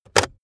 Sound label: car backseat table pull in cupholder fast